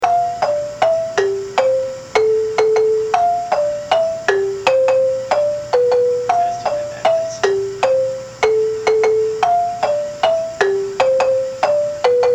low, marimba; manhanga

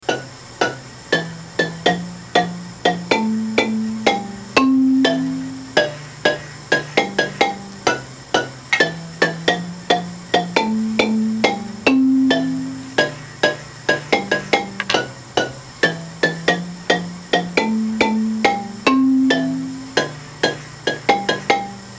bari bouncy, marimba; manhanga

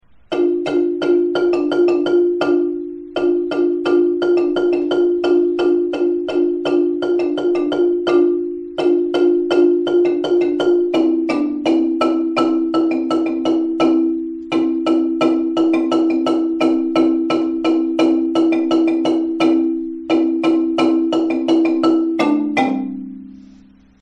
verse, marimba

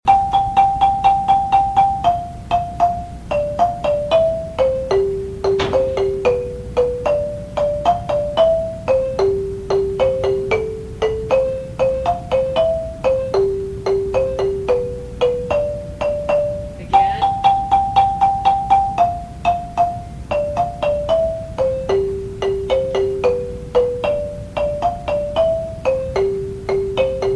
lead main, marimba

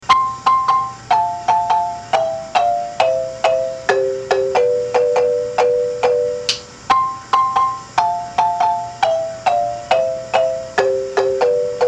high, marimba; manhanga

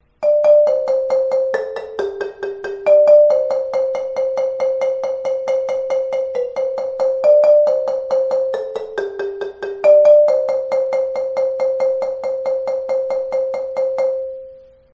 pre chorus, marimba

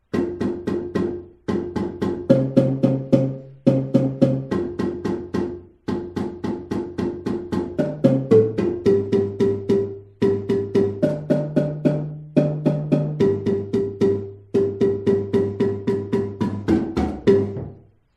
bass layering, marimba

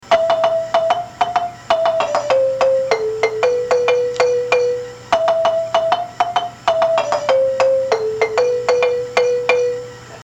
lead high answer, marimba; manhanga

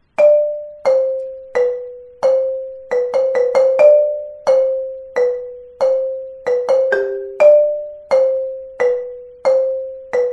marimba, verse

